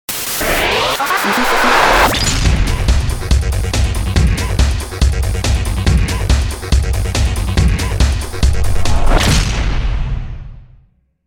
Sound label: Supercharger Groove; DJ sounds; Mtrax